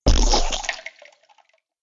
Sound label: Muddy Puddle Splat; Slimy Sound Effects; sound design